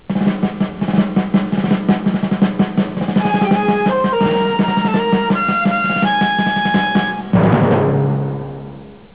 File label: instrmtl drums